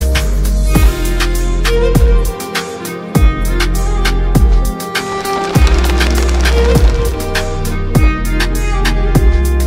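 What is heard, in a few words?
instrumental ringtone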